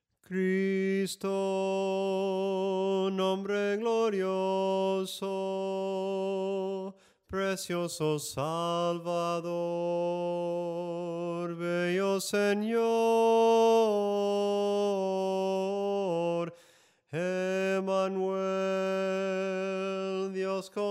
SOPRANO CANTADO; cantos del camino

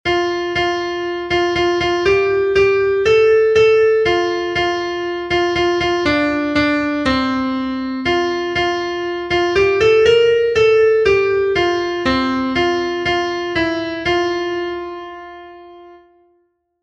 SOPRANO, himnos en piano; 32 GRACIAS POR TODAS LAS MANANAS; cantos del camino

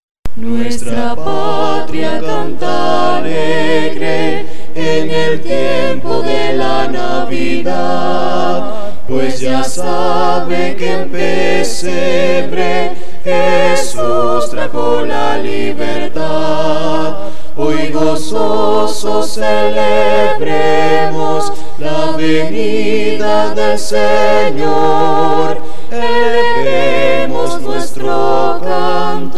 NAVIDAD LATINA, himnos a capella; cantos del camino

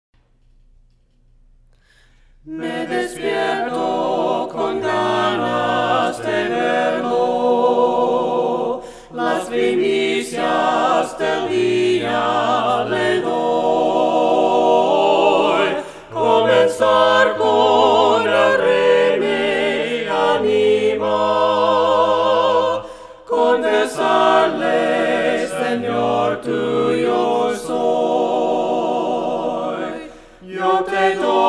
LAS PRIMICIAS DEL DIA VOCES

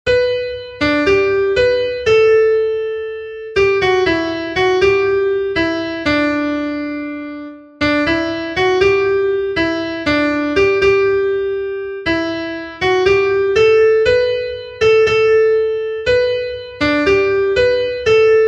SOPRANO, himnos en piano; cantos del camino

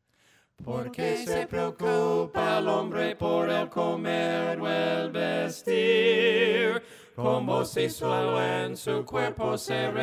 POR QUE SE PREOCUPA EL HOMBRE VOCES, himnos a capella; cantos del camino

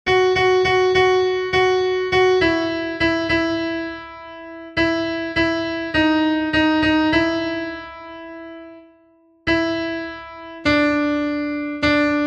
CONTRALTO, himnos en piano; cantos del camino

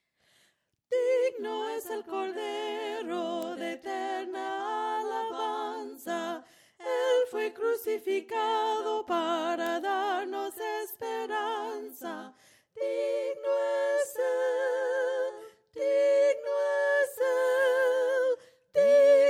DIGNO ES EL CORDERO VOCES, himnos a capella; cantos del camino

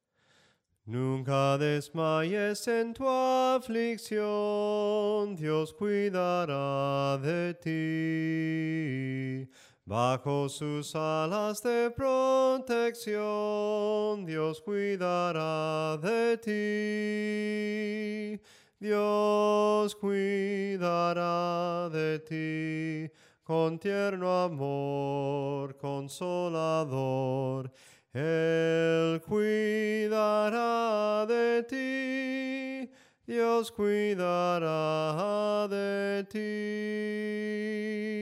SOPRANO CANTADO; NUNCA DESMAYES

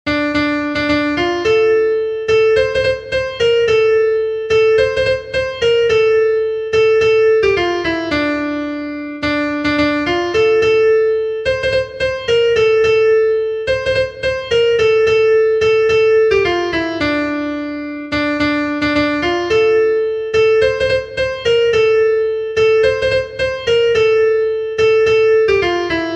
soprano, himnos en piano; 5 TE ALABARE SENOR; cantos del camino

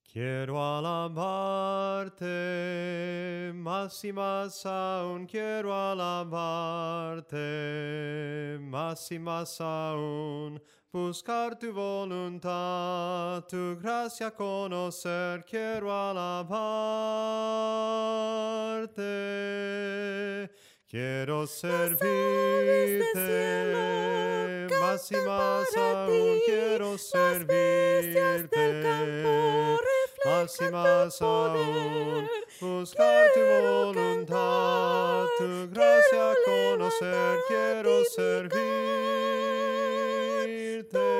SOPRANO CANTADO, himnos en melodia soprano

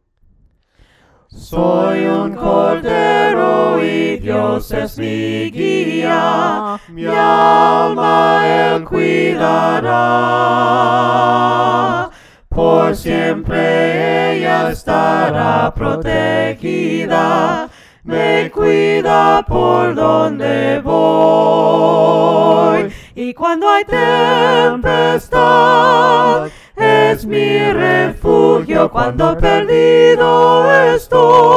VOCES, himnos a capella; cantos del camino

